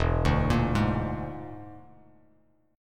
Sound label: E 7th Flat 5th, down, Bass Standard; E7b5 arpeggiated; JGuitar